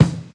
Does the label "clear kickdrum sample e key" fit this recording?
Yes